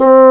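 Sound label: woodwind C1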